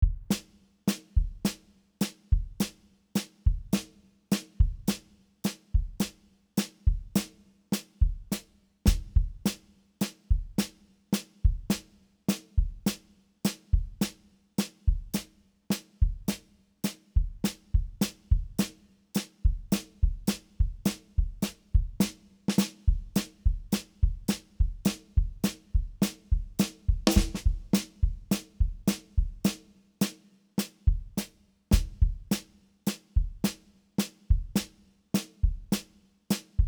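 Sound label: Battle Layer Percussion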